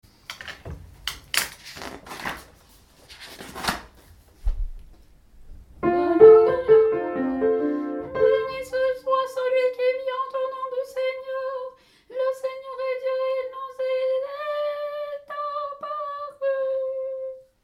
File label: Tenor; Beni soit